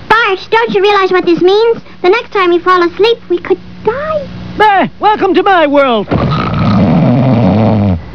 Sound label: snore; Bart